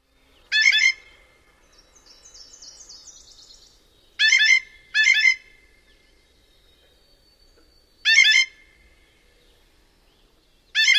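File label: musical twee-dee, Blue Jay; NY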